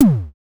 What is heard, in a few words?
DSM2 Tom; MPC DSM-2